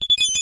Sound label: message; cyberpunk